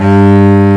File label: cello; Timidity